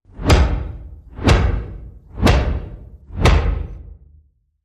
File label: Deep Knocks